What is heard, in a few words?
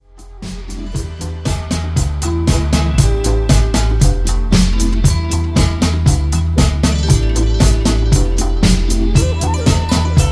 Harp Dub